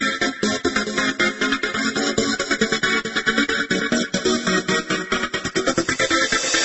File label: melody